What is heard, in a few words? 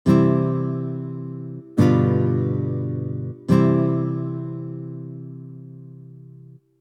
bow guitar